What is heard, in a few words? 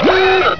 aooga